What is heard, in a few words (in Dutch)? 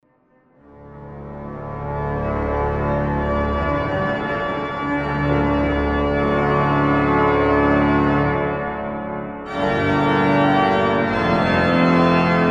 Intrada; Gaat Tot Zijn Poorten In Met Lof